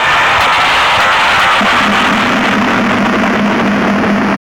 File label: Insectus, Hard & Loud Techno; USB Soundscan vol.01; AKAI